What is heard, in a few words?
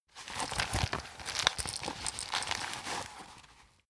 plastic bag handle aggressive